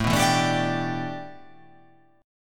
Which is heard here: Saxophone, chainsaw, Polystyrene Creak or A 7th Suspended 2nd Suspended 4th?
A 7th Suspended 2nd Suspended 4th